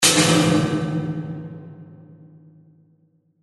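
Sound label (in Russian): zvuki jeho, echo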